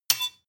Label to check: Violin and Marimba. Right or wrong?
Wrong